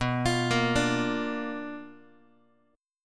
B Minor Major 7th Flat 5th Guitar; arpeggiated; JGuitar

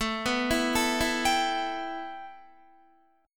A 7th Suspended 2nd Guitar; A7sus2; arpeggiated

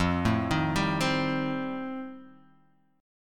F 9th F Guitar Open G, chord

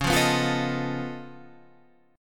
C sharp Augmented 9th C sharp, chord

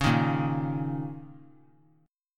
Bb Minor Double Flat 5th Bb; Bbmbb5; strummed; x,20,18,20; JGuitar

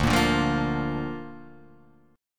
D Minor Sharp 5th D down; Dm#5 strummed; JGuitar

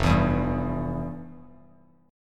F Major 7th Suspended 2nd, Bass Standard; strummed; FM7sus2; JGuitar

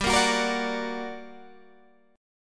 G Minor Major 7th, down, Guitar, Open G

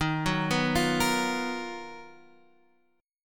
B Major Sharp 11th Eb

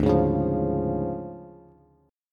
D Minor Major 7th Flat 5th D Bass; 10,11,11,10; DmM7b5 strummed

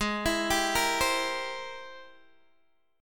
G sharp Augmented Major 9th G sharp Guitar DADGAD

